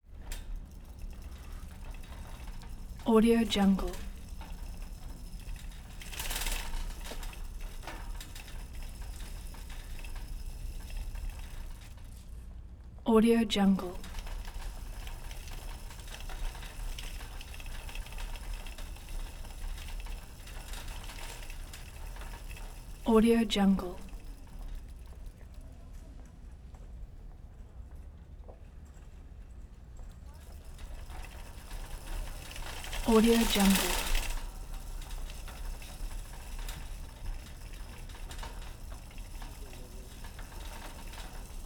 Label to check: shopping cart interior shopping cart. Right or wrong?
Right